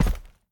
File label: mvt footstep; smite; gilgamesh